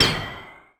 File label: Korg 01W MegaDrums; 300 Drum Machines